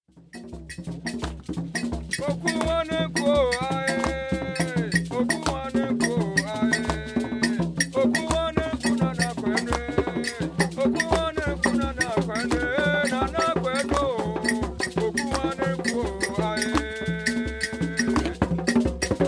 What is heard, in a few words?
Ghanian Polyrythmic Ensemble